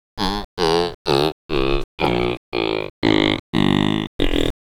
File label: Gazella subgutturosa growing-up